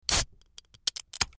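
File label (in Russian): открывают жестяную банку газировки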